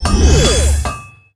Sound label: Equipment Upgrade Available; DunDef